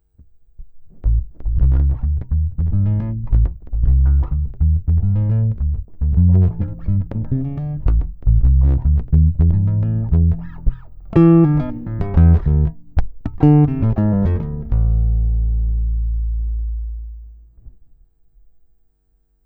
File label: bass; squier; api